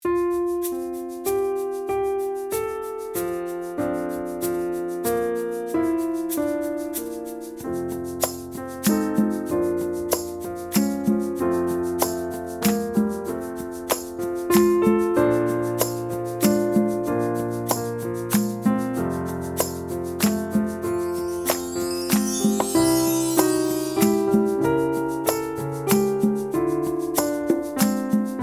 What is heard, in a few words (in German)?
leise playback; Playback, schnell